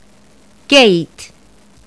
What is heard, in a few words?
gate